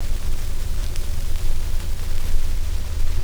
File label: nse, vinyl noise; house; PS-28 Vocal House Samples